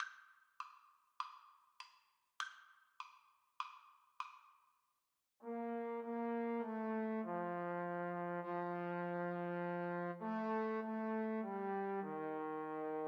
o sole mio, Trombone Duet

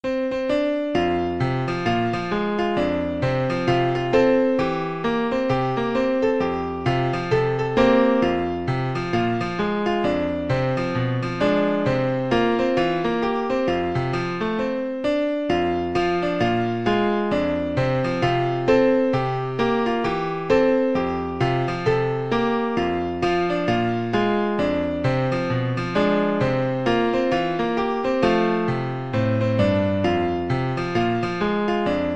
PNO

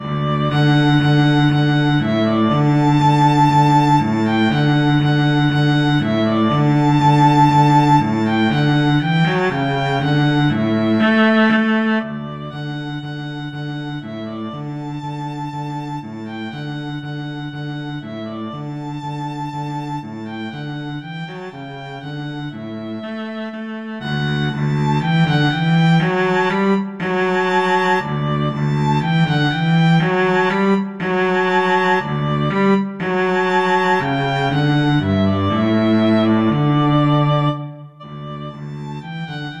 violin cello duet

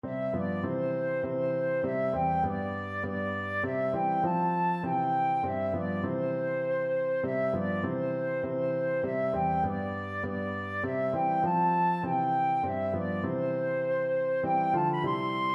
come thou fount flute

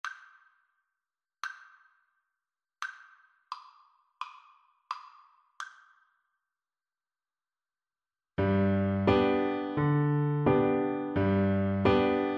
when the saints, oboe

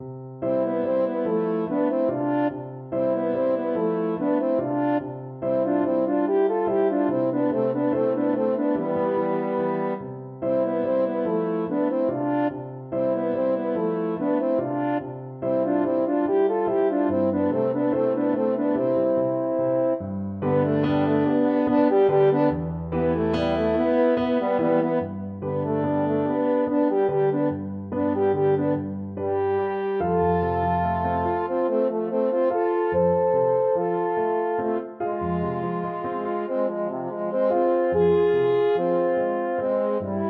french horn duet

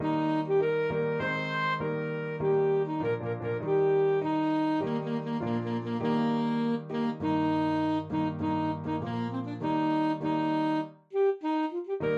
chinese anthem, saxophone

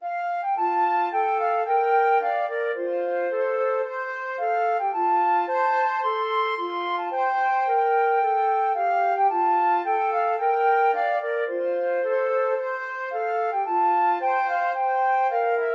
in the sweet by and by, flute duet

